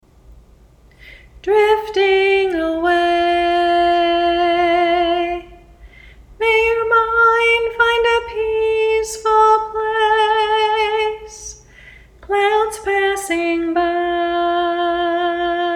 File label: Only Vocals HIGH HARMONY ONLY